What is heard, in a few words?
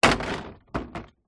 household plant pot large plastic impact ground